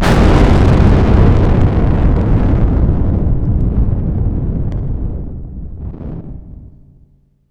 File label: explode; missionpack; items